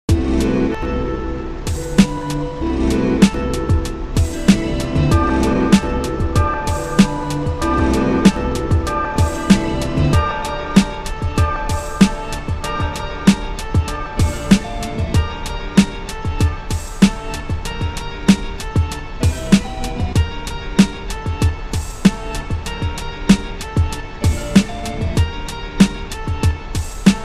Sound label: Instrumental